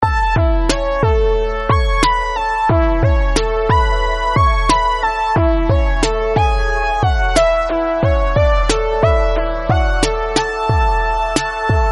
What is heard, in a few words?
piano and synth with beat